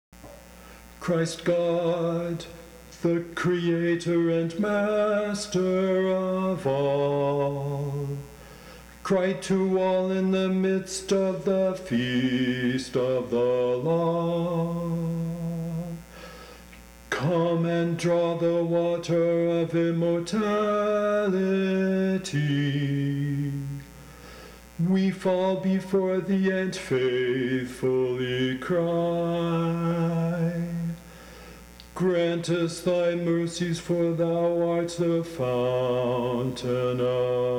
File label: kontakion mid pentecost bass